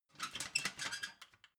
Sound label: ladder metal handling